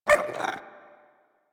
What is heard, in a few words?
Puppy Interact Bark Speak; Enemies; Kubrow; Warframe